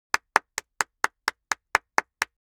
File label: Clap Sound